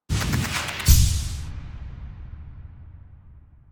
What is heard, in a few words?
superdash charge clean; hollow knight